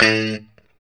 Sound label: POP A 2, STRAT guitar; Spectrasonics; Hans Zimmer Guitars VOL-1; JC-STRAT POP